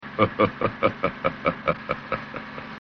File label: chuckles; Masterspy